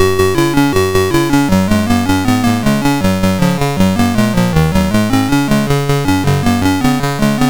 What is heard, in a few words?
Crystallus, MOS6581R4